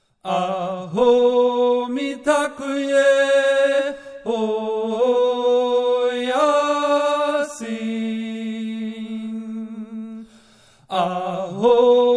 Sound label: tenor; aho mitakuye oyasin; sing for water 2017